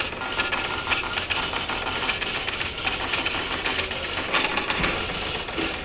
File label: telex; Mafie 1